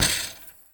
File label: Street Ball Chain Rim, Street Basketball; SFX Kit